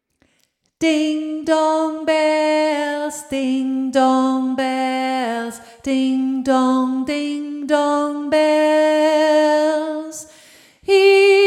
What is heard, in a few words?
dingdongbells kanon; Stimme 2